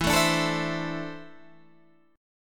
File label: E 7th Suspended 4th Sharp 5th; E7sus4#5 strummed; JGuitar